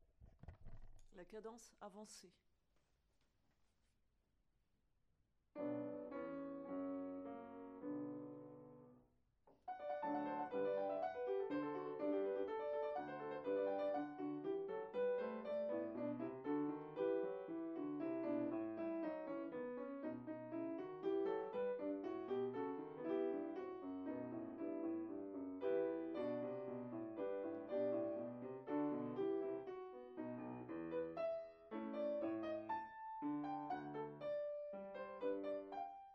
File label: piano; mix avec des tempi variés